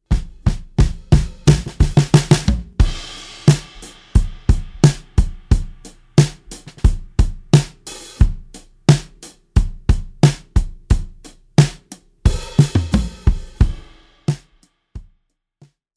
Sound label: acoustic drums moderate; bloom